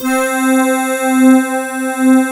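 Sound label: sad accordion C4; TRISTESSE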